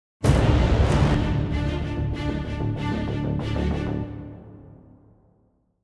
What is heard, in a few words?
190bpm; Legends of Pegasus; windows gamerip 2012